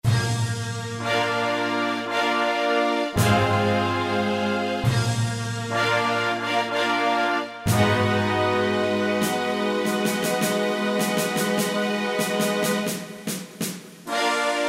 Total Orchestra; Kurzweil K2000 V3

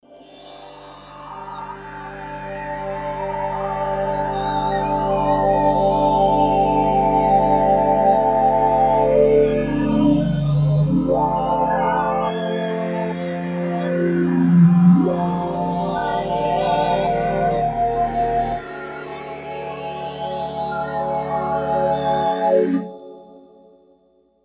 Kawai K5000S

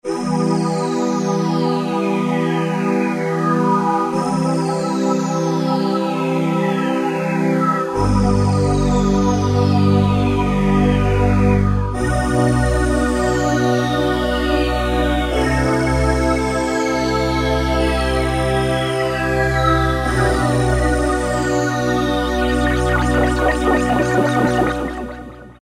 vox-Jaxvox; E-mu Orbit V2; Factory presets

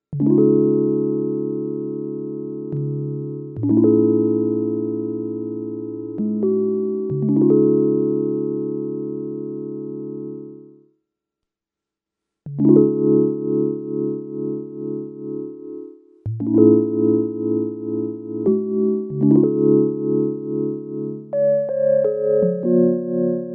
AUTO PAN

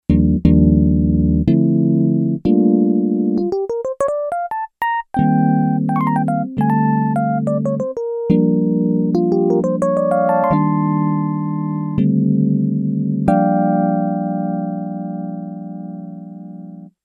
E.Piano 1, Yamaha DX11; Factory Preset Voices

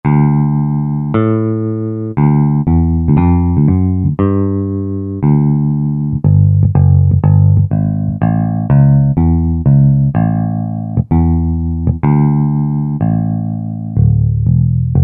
6 Strings Bs; Country; Roland SR-JV80-17 Country Collection

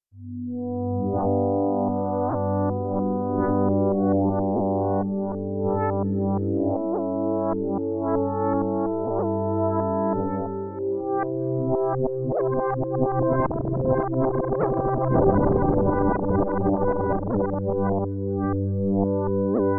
Ryukyu Pad, Korg Radias-R

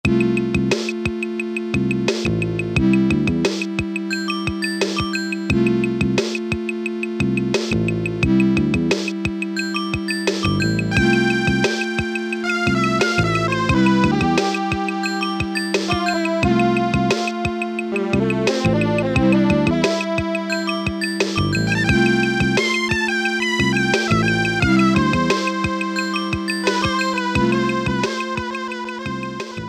MELLOW RAP, Casio Rapman rap patterns

